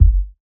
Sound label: Tom G#; samples